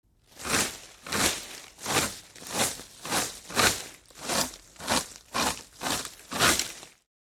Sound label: Rake few leaves on grass garden